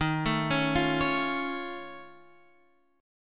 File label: F Augmented 7th D sharp, chord